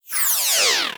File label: Animation Electronic